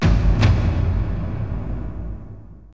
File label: drum doom